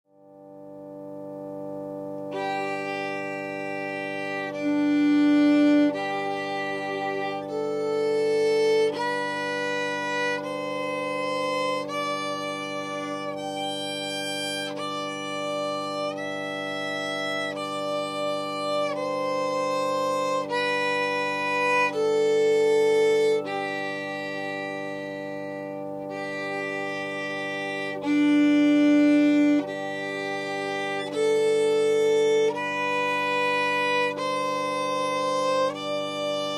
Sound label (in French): echelle